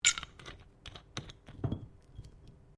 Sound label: Bottle Glass Cap Metal Screw On; Various bottles; Glass (Sound Effects:Materials)